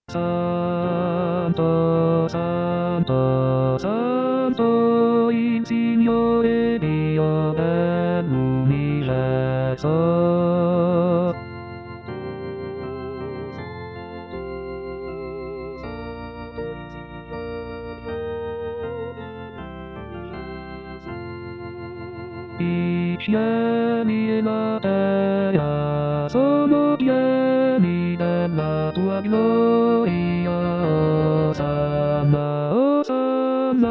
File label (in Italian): Giubilare Santo Bassi; Bartolucci